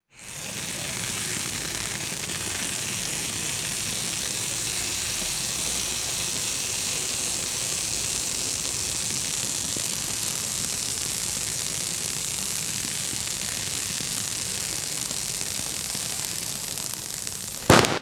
hissing and crackling fireworks fountain

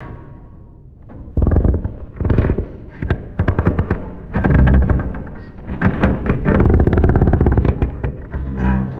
large metal structure groaning and creaking

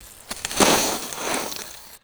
crisp snow falling through tree branches

